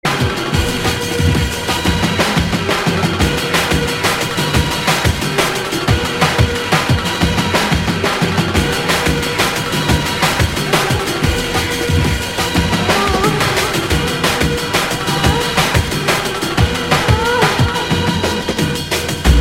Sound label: dnb; 2000 era